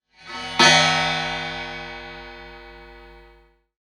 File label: Raw HiHat, contact mic